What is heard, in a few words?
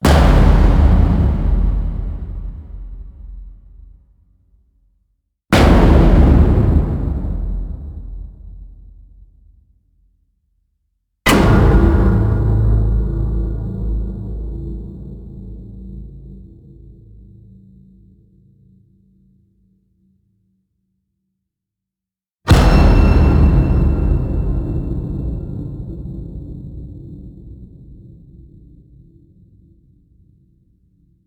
hit drumassive